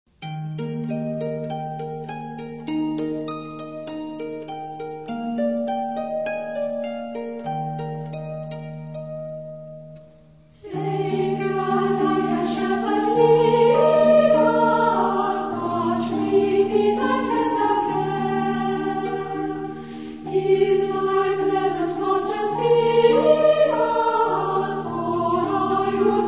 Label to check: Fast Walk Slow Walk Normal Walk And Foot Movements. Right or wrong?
Wrong